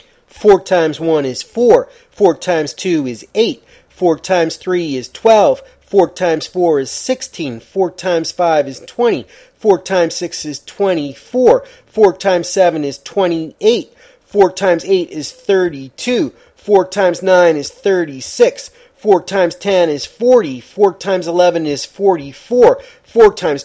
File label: 4x4 multiplication chant